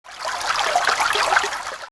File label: water